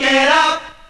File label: VOX SUNG; AMG Megabass REMIX! VOL-2